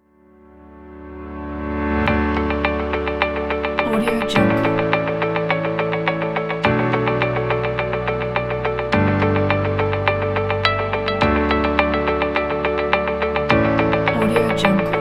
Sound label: the corporate soft pack